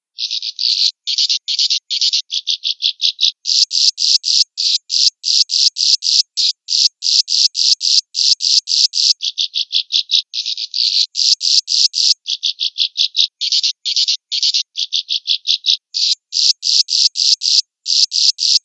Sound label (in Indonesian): suara burung walet